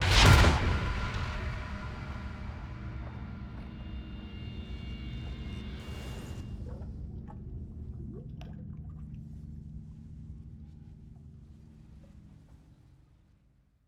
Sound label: shock riser; Joe's Diner